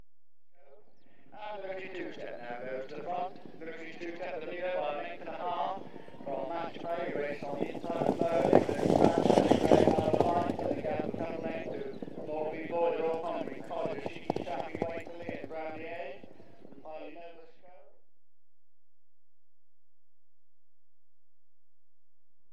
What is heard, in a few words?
Point TO Point Racing, Field Pass, Equestrian Events; BBC Sound Effects Library